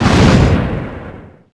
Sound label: EXPLODE